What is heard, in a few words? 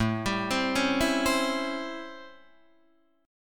A 7th Sharp 9th, Guitar, Open D